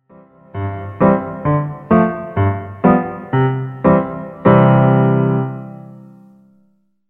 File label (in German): boehmische polka playalong